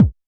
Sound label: House Kik, Alesis SR-18